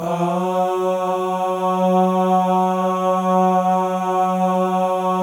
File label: MALE AHS, Symphony of Voices; Spectrasonics